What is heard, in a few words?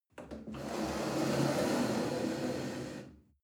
chair wood slide on floor soft